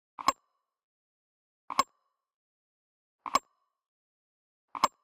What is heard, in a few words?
ui back; Sonniss GDC